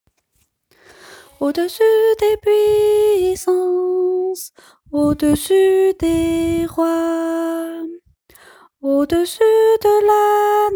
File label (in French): soprano